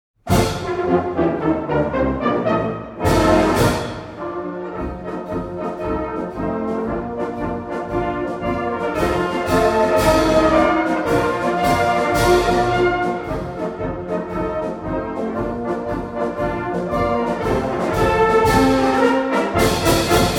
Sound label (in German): Freiheitsglocken; MK Fiss